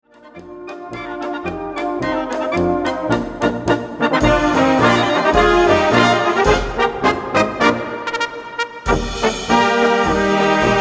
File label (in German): Polka